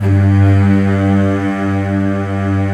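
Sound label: bright strings G2; BRITESTRG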